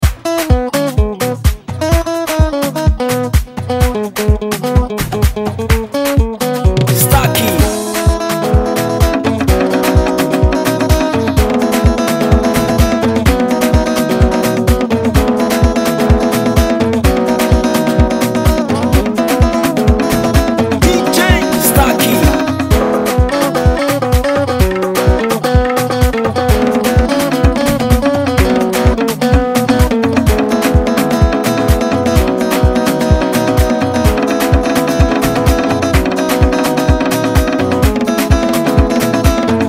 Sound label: Guitar Drum Dance